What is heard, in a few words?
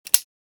Military hook clipping metal; Military Equipment